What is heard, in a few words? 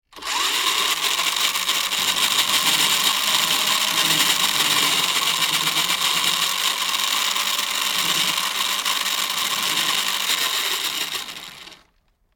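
Radio Controlled Electric Car Revs, close up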